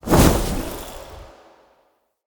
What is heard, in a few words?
Casting Device Cast Right; Galene